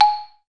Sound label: xylo G#4; E-MU Formula 4000 Series Vol. 5; Protozoa; Proteus2 Presets